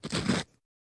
Grinding Pepper Mill, Single Grind

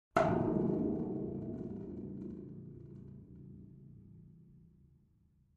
Spring Drum Sml

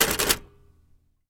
Typewriter Back Spa; typewriters

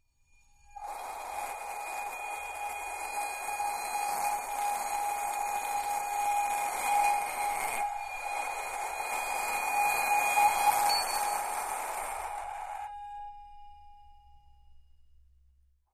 Brass Bowls Bowed, singing bowls

